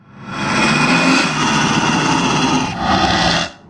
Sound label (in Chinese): 挑衅, 鬼,幽灵; Enemy